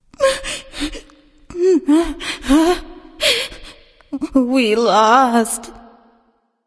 boo hoo; daji

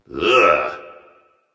ugh; smite; hou yi

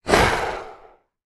hiss; Smite; Sobek

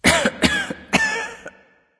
clearthroat; erlangshen

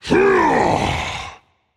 battlecry rage; chaac

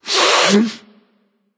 nv breath, vox; smite; voicepack; vulcan

